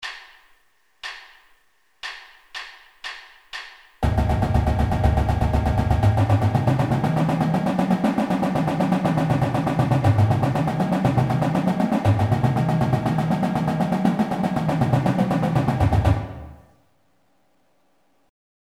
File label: legato fast, drum, marching; Warm-Ups